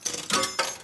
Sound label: slot start